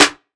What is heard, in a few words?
steel snare drum sample c sharp key